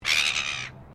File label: Bird Silver Gull Call, Australian birds